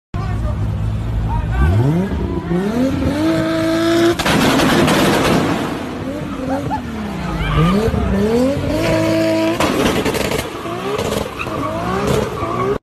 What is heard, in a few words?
bullet exhaust